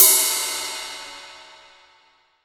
TIP, 18 ZIL RIDE

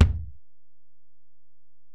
Ac BD, Alesis DM-PRO; 300 Drum Machines

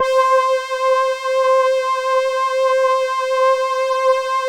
LAMBDA, String Machines; Best Service; XX Large Most Wanted 2

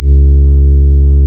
REED C#; Big Fish Audio; Synclav FM

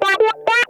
STRANGLED, Funk Guitar; Zero G - Funk Guitar

